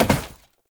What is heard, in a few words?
Step Glass, Foley; Warframe; Oraxia